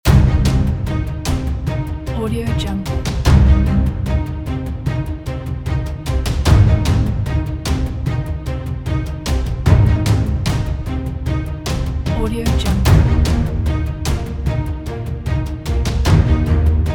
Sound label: dramatic epic